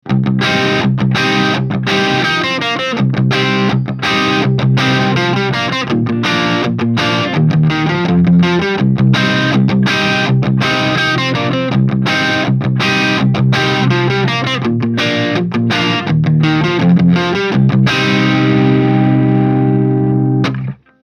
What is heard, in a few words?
Peavey 6505 Crunch